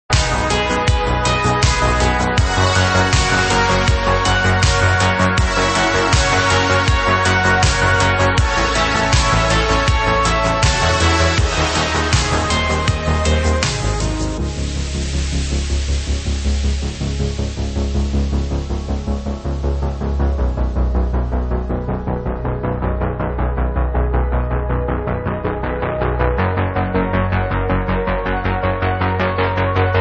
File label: Synthwave Remix